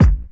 low hip hop kick drum single hit A key

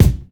00s snappy bass drum f key; kick drum samples

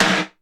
clean snare drum sound g sharp key

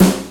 dark snare one shot f sharp key

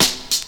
snappy hip hop steel snare drum sample c key; tuned drums c key

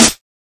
subtle reverb snare sound a sharp key; snare drum samples

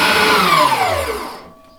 trimmer engine power down

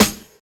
nice acoustic snare sound g sharp key

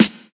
smooth snare sample G sharp key; snare drum samples

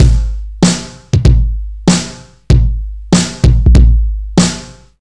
96 bpm breakbeat sample G sharp key; drum loops

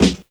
dark snare drum g key

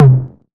reverb tom one shot c key

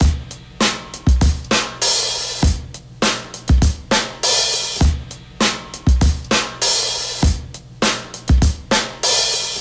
100 bpm drum groove d key

